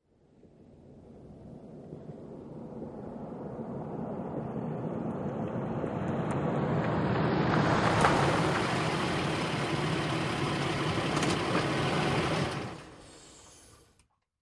Truck SUV Driving At 55-65 Mph, Slows To Stop, Shut Off E